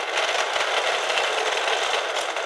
ROLL

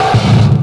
menu; Tony Hawk's Project 8; XBOX

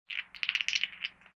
Dice Shake, craps

